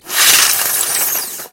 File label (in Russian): zvuki speshki